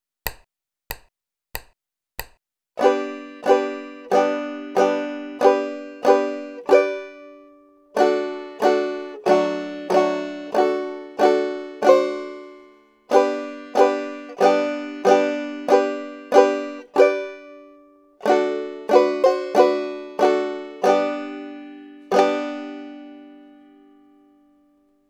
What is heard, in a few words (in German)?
Melodie und Akkordgriffe skip to my lou